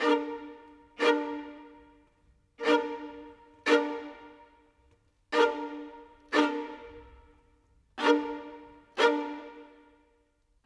Viola, Orchestral; Sonic Foundry (Sony Creative Software); Orchestral VOL-2; Modern 07

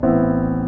Piano Chord; EXOLINGUISTK; Brooklyn Feels

